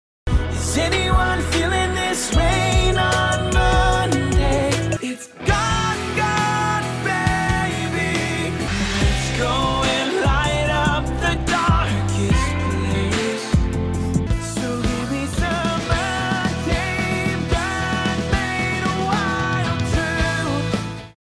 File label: English Pop Rock Ballads